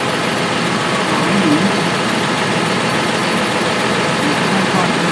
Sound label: tractor engine